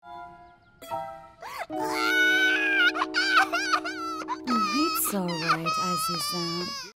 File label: crying